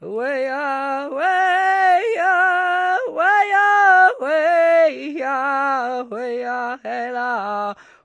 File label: native vox, Native American; sheshone